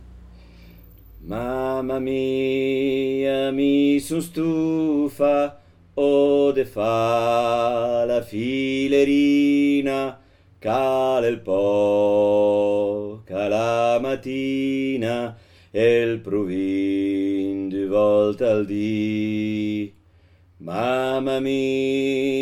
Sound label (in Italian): mamma mia mi sun stufa